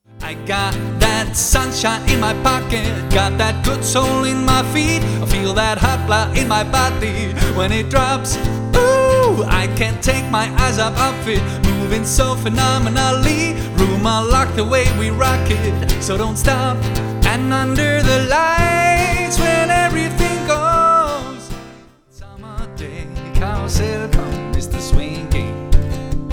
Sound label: solo uptempo